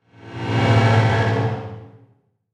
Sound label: SWELL DARK STA, Swell Dark Static Sweeper; Production Element Imaging Element Accent Transition